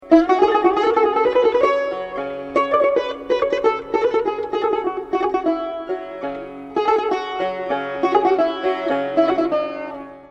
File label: tar; Saz; Sonati